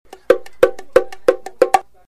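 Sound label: bongo chime ringing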